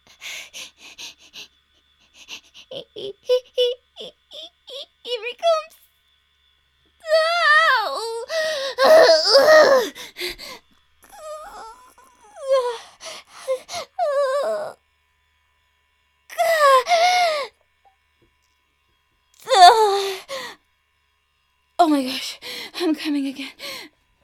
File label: choke